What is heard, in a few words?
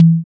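percussion low, trap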